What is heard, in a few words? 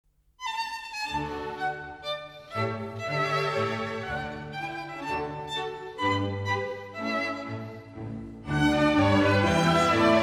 Violin Concertos; Mozart; Sondeckis; Violinkonzert Nr4